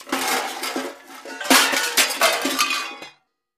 Junk Thrown Junk Pile, junk crashes

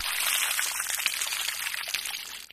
Water Pour Hvy, splashes

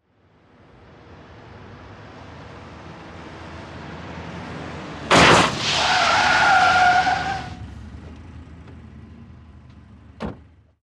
Auto Blow Out Skid, blowouts

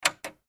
Push Button Plastic

